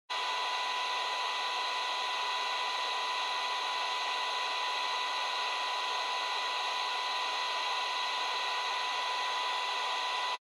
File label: Radio Signal Noise FM